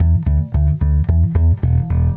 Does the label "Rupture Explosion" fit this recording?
No